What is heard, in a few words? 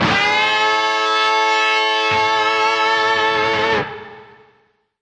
harmony 5th bend, Guitars